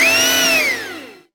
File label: pump on